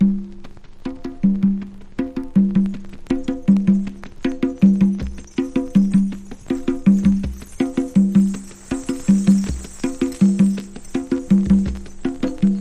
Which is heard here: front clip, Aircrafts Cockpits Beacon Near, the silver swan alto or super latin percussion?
super latin percussion